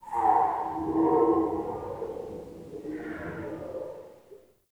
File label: Cave Ocean Animal Very Far; Ambience; Midrath Island